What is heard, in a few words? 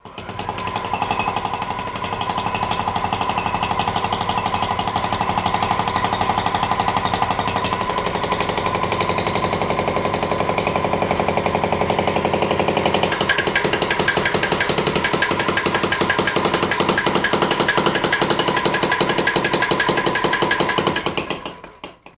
Industry Rattles, factory